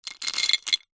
food savory snack wasabi peas in ceramic bowl handful drop in; zapsplat